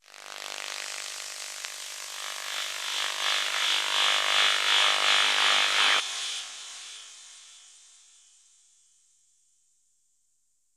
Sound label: AMBNT 160; Ambient